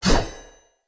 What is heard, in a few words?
Saw Dagger Whoosh Heavy; Weapons; Player; Swords